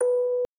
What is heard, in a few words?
orgel